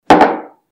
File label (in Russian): chashka na stol